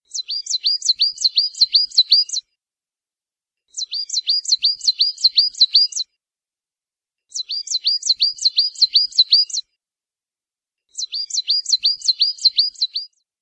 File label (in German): Tannenmeise